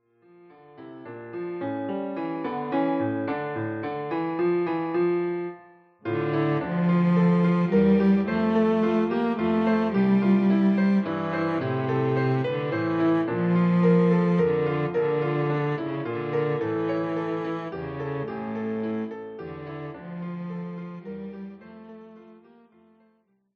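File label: Greensleeves, Violoncello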